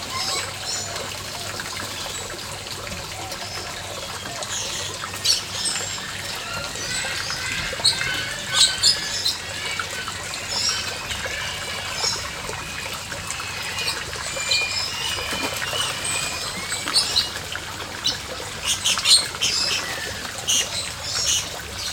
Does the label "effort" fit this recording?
No